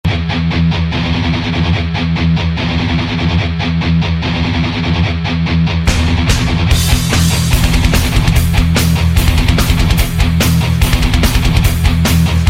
rock

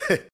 NYEH HEH HEH HEH

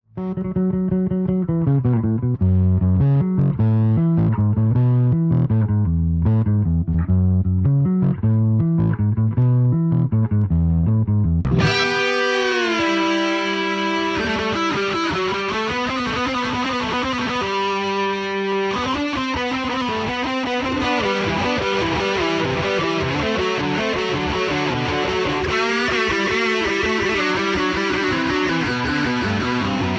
Bass Solo

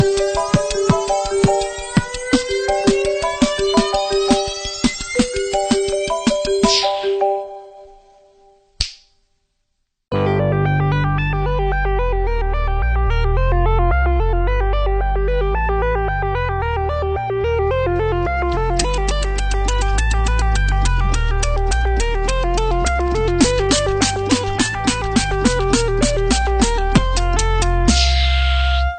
Marimba; Iphone

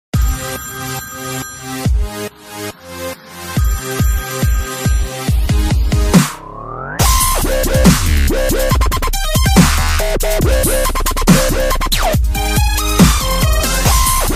Electro Dubstep tone

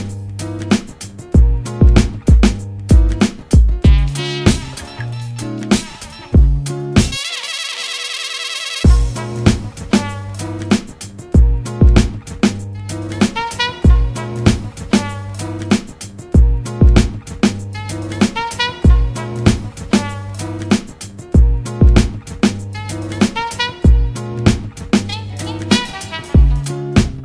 Hip Hop

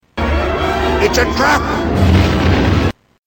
Trap

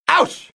Ouch

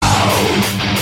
Message metal

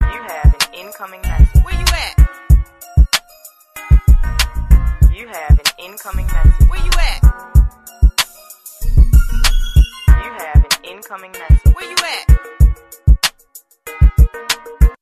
Farts

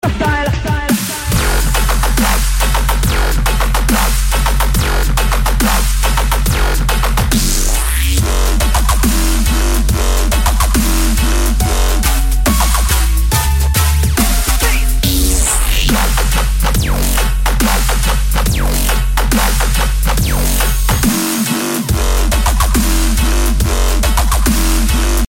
Murder Dubstep

